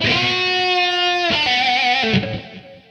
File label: 122 lead